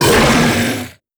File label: Boss Hit Light; netent